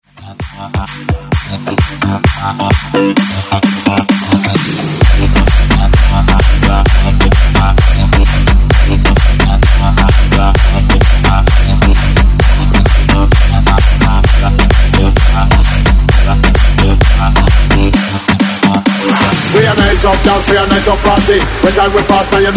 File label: 130Bpm BREAKMIX; DJDOLLS